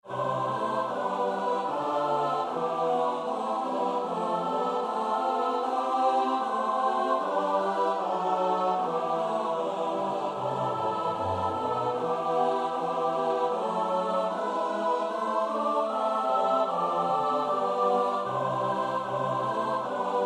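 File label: soprano alto tenor bass; Bach (Johann Sebastiaan); BWV 149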